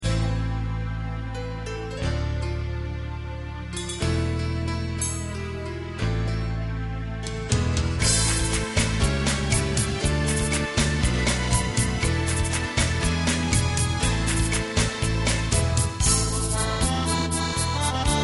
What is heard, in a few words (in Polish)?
cyganskie tango